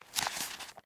Papers Exchange

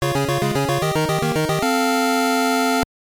Jingle; tenchi wo kurau 1994 gb

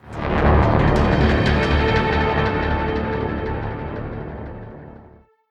Stinger Quick

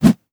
Swing Punch; Afterbern Democralypse; Windows

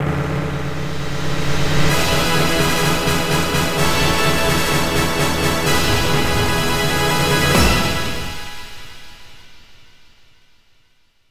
Congratulations!; Five-a-Side Soccer; Konami Ultra Sports; arcade

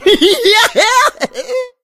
Alarm Egg Stolen; Angry Birds

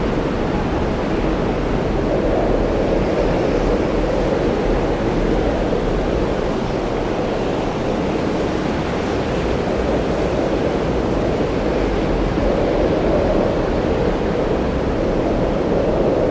Wind canyon

